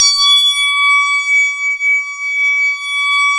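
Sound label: synth Analog; Best Service Gigapack 1; SYN ANA2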